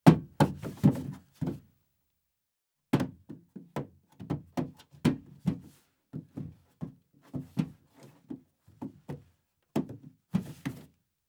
Car Roof Door Hits; Fairlight Sound Lib

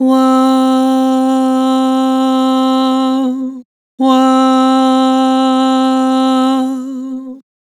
Woah, Vocals, Vibrato; Harmor Vocals

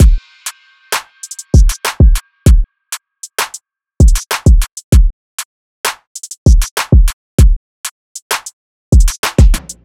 Drum Loop - 195 BPM Drum Fill; Loop Stems; Cymatics; Behind Drum Loop

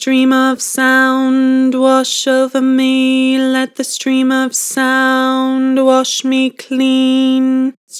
Vibrato, Vocals; Stream of sound; Harmor Vocals

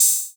Open Hat, Analog Drum Kit; Glint; Cymatics - ANALOG EVOLUTION Beta

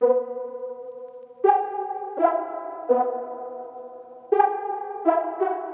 Dark Melody Loop - 167 BPM B Min Pad; Melodies; Loop Stems; Cymatics; Oracle Sample Pack

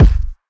Kick - A; Drums - One Shots; Hip Hop; Cymatics - 9God Hip Hop Sample Pack

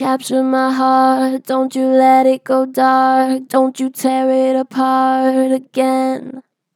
Strong Flat, Harmor Vocals, Pitch Flat Strong; Capture my heart

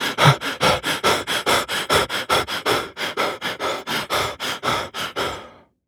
Weird scary

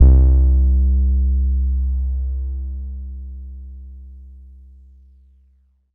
Synth Bass C, Lo-Fi; HipHop; Melody Kits; One Shot; GhostHack; Ghosthack - AC2024 - Day 5 - Melody Kits; Gone 76 Bm

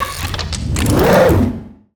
wooshes bent metal; Whooshes

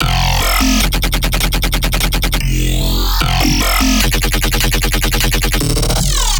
Bass Loop F, Dubstep, 150bpm; Flames; Ghosthack; Dubstep Sound Arsenal

